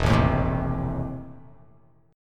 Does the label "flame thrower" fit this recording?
No